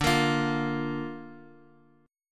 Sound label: D Minor Sharp 5th D Guitar Open G